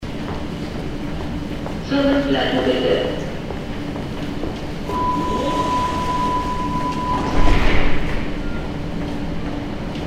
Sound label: subway departure